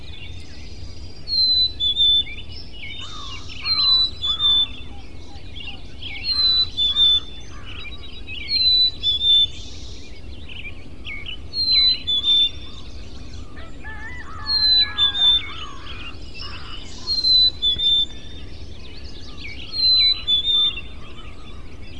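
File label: black-capped chickadee; Mass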